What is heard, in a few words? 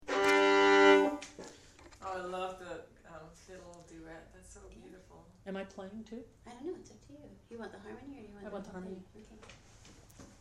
Pretty Saro harmony on fiddle